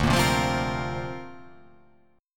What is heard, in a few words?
D Minor 7th Sharp 5th, Dm7#5 chord; strummed